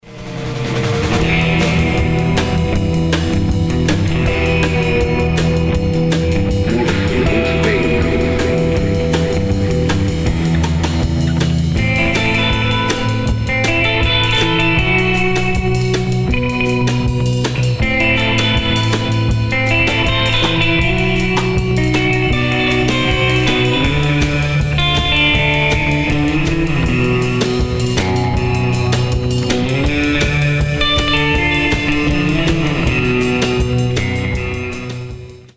reverb rays

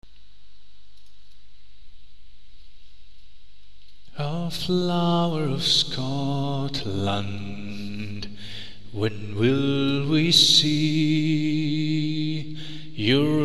acapella

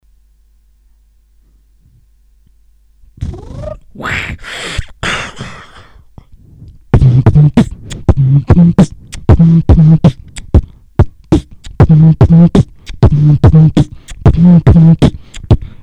beatbox

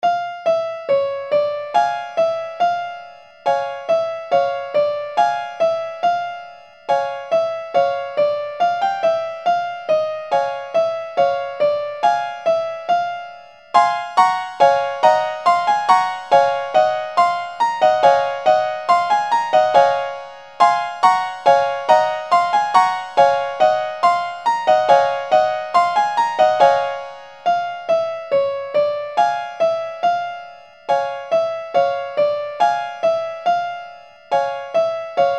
piano

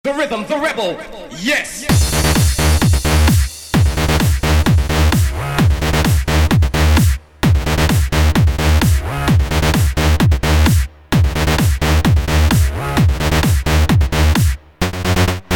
electro remix

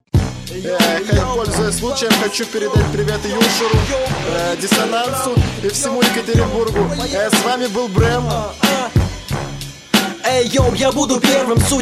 instr

